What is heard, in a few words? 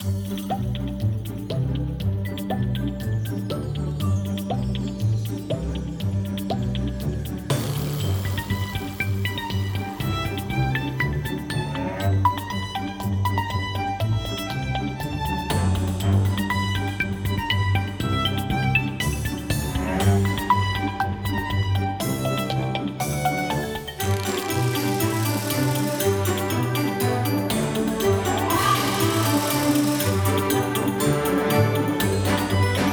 Playtime Ambient